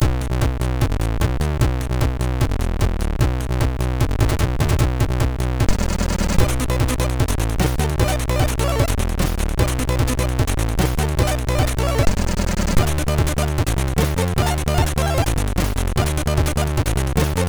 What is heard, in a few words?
Ode Techno, MOS6581R3